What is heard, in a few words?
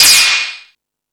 Rap Session, E-MU Pro-Cussion